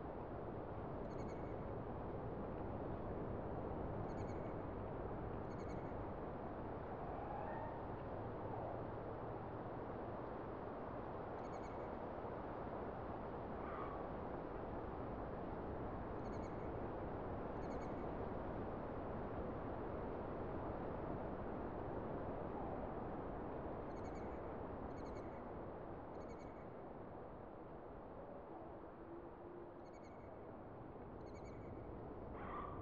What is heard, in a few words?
int small room; Titanfall 2